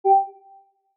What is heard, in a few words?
Rollover, Short